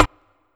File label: Rim